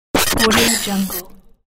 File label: glitch